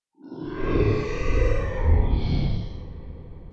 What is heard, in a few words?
growl